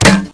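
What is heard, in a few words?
weapon reload panzerschreck, weapons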